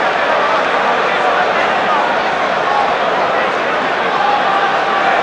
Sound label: Medium Idle, Crowd; David Beckham Soccer; XBOX